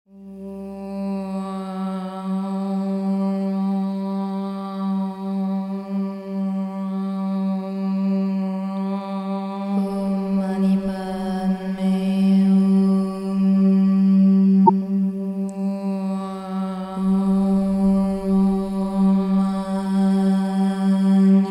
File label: Om mani padme hum, Sacred Mantras, crystal voice